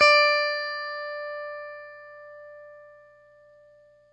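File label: Stratocaster, Guitar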